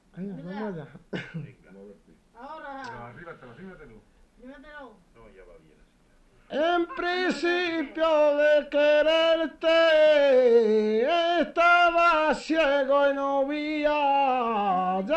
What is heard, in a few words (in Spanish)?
andalucia, en precipio